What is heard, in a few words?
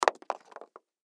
High Heel Drop, High Heel Drop Cement Single; Shoe Sound Effects; Foley; Blastwave